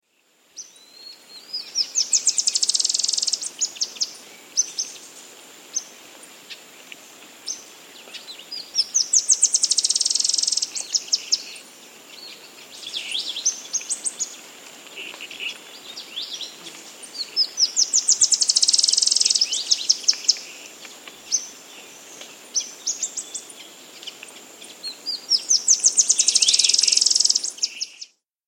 Ash-Winged Antwren; S. America

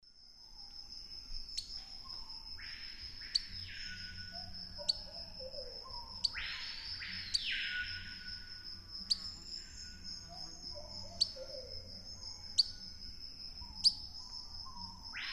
Myiobius barbatus, calls or song; S. America; Eastern Lowlands

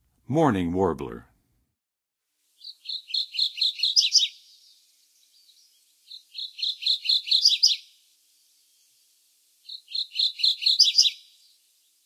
Mourning Warbler, Songs of Birds of North America; Western USA; Western USA Songbirds

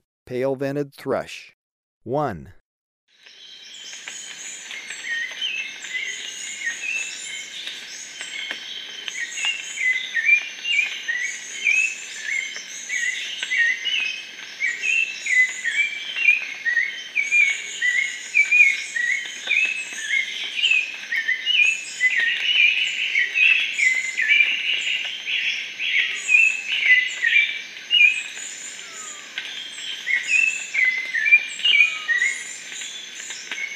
Pale-vented Thrush, Birds of Ecuador; S. America

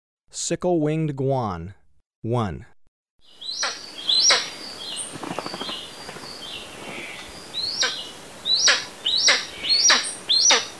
Sickle-winged Guan; S. America

